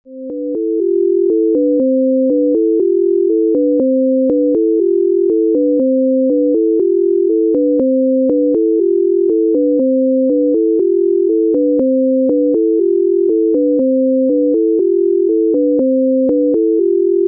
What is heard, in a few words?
scale illusion